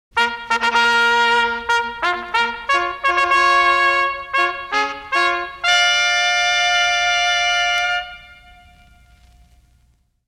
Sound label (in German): Musikeffekte Fanfare